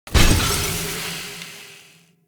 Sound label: Pipe Damaged; Encounters; Ode Pollution Tower